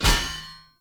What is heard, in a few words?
Sphere Polearm Medium Parry Impact; Weapon Impacts; Player; Combat